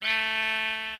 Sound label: say A4, sheep; Minecraft; mob